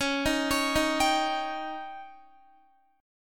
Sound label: Db Suspended 2nd Flat 5th Db down Guitar; arpeggiated; 4F,4A,5C,5G,5C,6E; Dbsus2b5